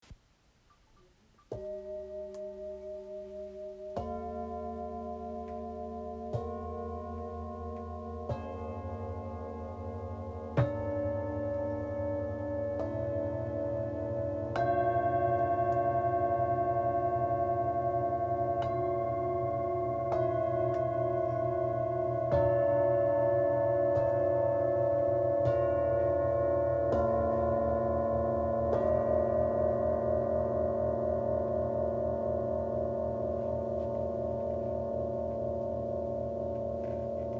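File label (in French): Set therapeute, bols chantants tibétains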